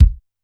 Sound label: Bass Drum Hard, Standard Kit, Yamaha PSR-E323